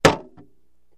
Mallet Hits Wood Shield

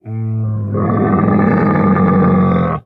Camel Groan Single; camels

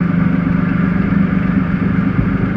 Boat Idl Above Water

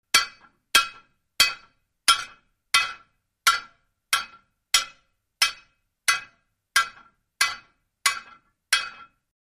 Anvil Hits Steady Met

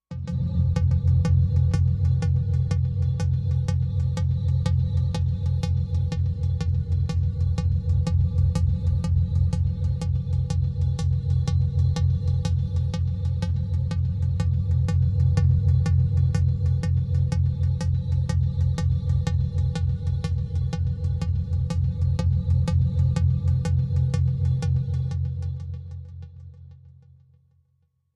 Fear Vending Machine, eerie ambience

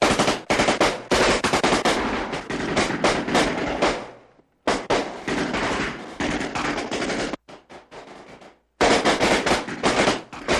Gun Fight Vari Shots, modern

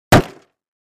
Plastic Car Door Hit

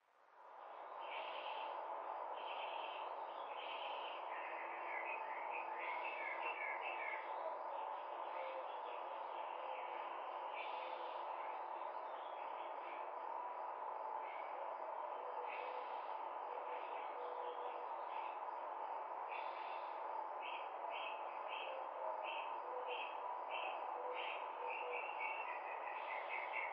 Birds Day Ambience

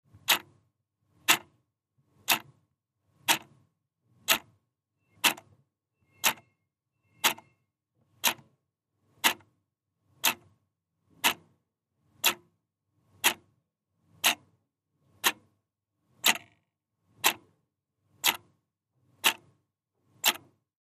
Clock Tick Tack, ticks

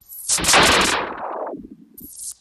Starship 1 Overhear

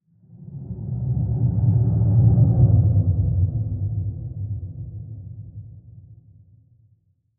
Space Ship Pass By; spaceship fx

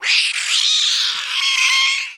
Monster Cat Growl, Monster Creature Growl

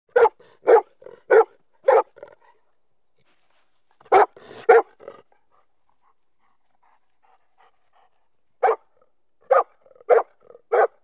Dog Retriever Barks, golden lab

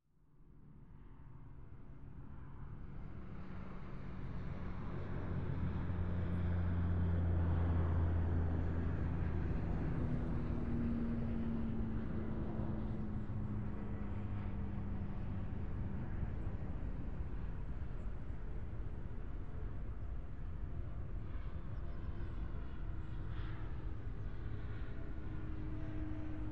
Light Small Plane Bys

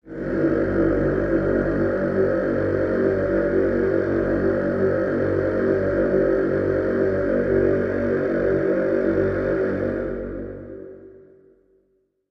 Music Horror Male Dr, choirs

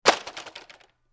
RC Car Toy Car Drops On

